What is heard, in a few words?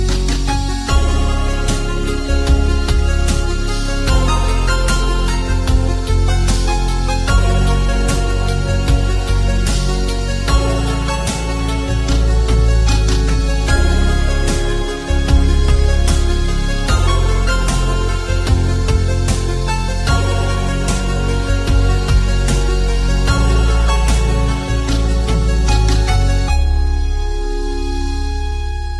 8bit